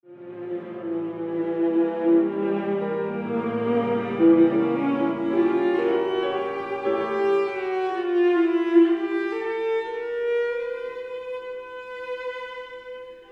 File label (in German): Meditation, viola mit klavier; trauermusik leipzig